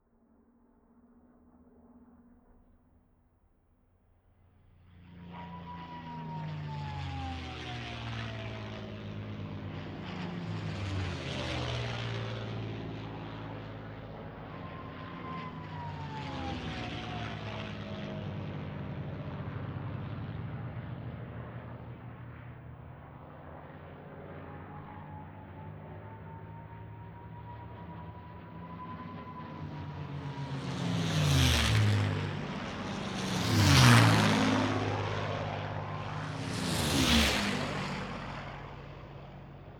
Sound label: warbirds